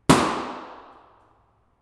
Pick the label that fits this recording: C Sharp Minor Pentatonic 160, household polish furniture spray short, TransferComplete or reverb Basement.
reverb Basement